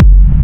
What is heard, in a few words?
BD, korgdrums; Korg Electribe; ESX-1; electribrary-3